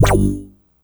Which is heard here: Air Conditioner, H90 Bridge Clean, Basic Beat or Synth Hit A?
Synth Hit A